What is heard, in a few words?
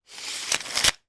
industrial tool tape measure retract short length